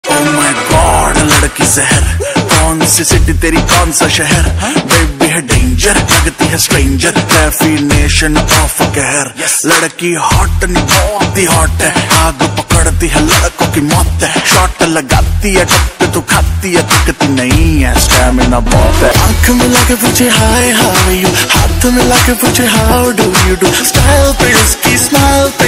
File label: hindi rap ringtone